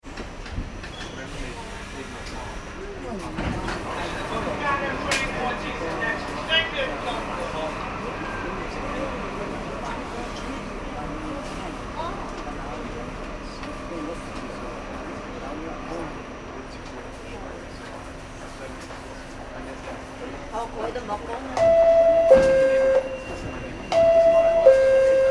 transportation train subway idle internal nyc, Subway Train At Station (Onboard/Internal); Subway Train Just Arrived At Station ( Nyc ) - Internal Recording - Doors Open And Passeng; Subways; Vehicles; McKinney Sound